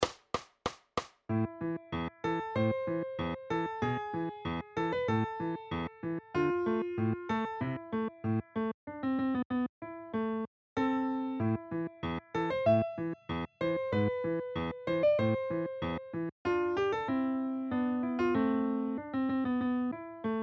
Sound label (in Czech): Uhersky tanec; Hity klasiky 1